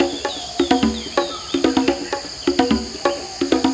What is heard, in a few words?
Latin House Loop 128 BPM; Vengeance Samples